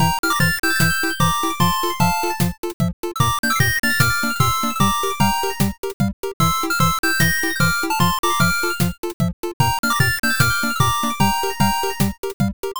bgm bright

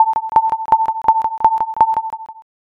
railroad

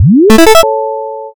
boot

